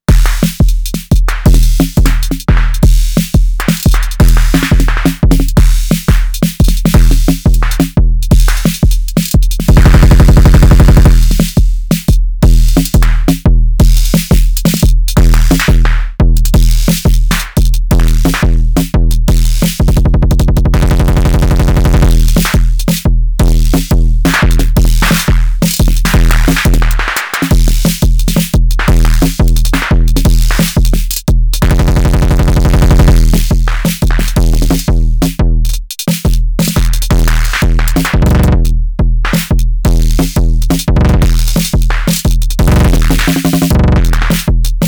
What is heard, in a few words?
Arturia DrumBrute Impact Pattern